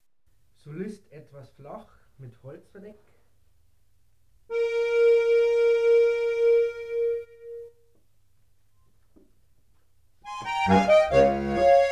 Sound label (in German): Solist etwas flach mit Holzverdeck, Tremolos